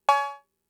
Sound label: Cowbell; Fricke MFB-522